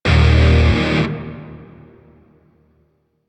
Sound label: Shawzin Chromatic Chord G; Grineer; DE; Warframe